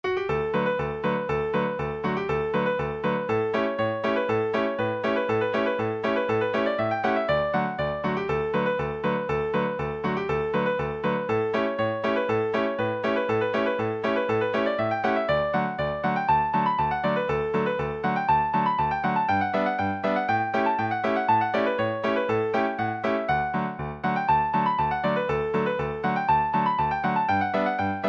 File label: ragtime annie, session tune; guitar